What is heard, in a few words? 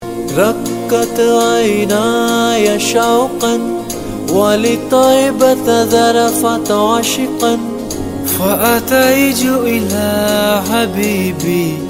islamic ringtone